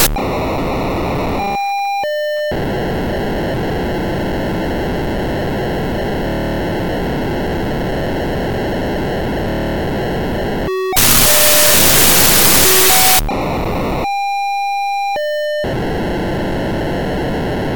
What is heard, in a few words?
POCSAG Sound